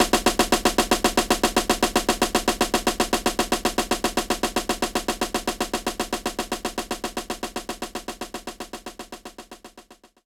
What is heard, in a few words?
Jazz Rim Shot